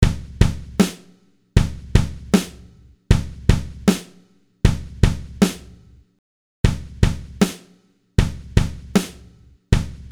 Kick Valve Drive Snare Master Leveler Channel Tape Low